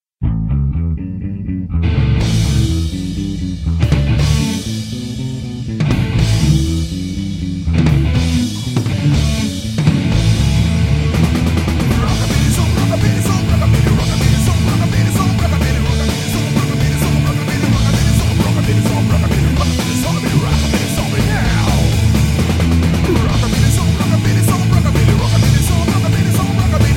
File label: rockabilly; zombie